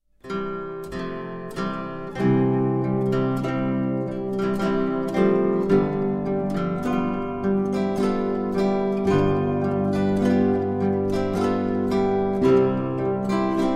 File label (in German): Menuett aus Rohr, zither